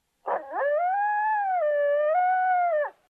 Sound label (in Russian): odinochnyj daleko